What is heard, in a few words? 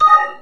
ui Clicked